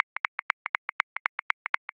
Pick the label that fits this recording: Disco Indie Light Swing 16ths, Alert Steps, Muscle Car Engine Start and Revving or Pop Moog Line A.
Disco Indie Light Swing 16ths